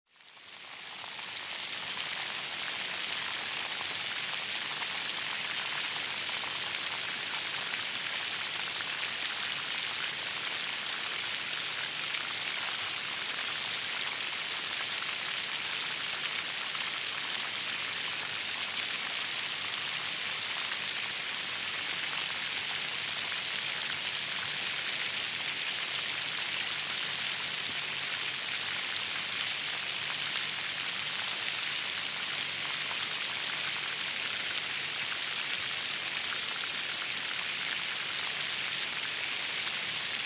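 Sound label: onto grassy surface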